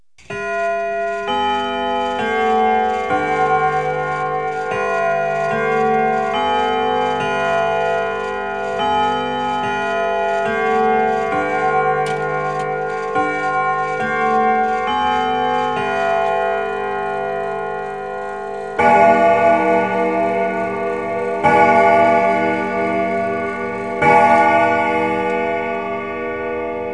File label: Westminster, Chimes; Household; 1,000 Sounds (16-bit)